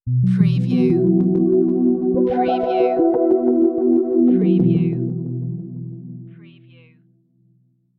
Scifi Audio Logo Ident, Darkly Shining, Light Signals; futuristic